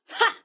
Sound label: woman attack; soundscrate